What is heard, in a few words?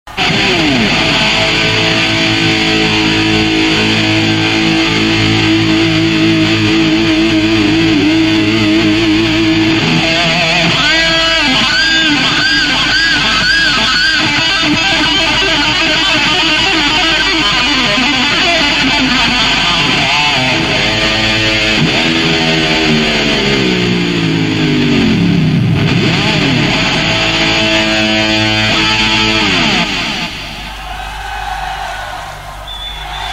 Guitar Solo, Rock Metal